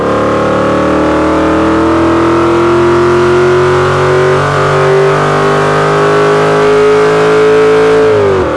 third, vehicles cars; tdmcars

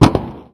backfire, rally; geareffectsounds

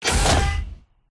leg lift; silverback

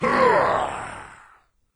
melee; doom3; commando

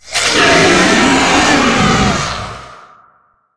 spider striking

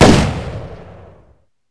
aug, weapons

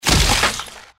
gecko death; npc

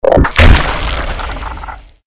mirelurk attack; magmalurk; npc

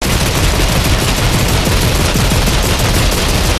hammerturret fireloop enemy; gow

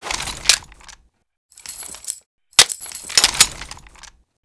mg42 world reload; weapons

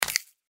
mantis foot; npc

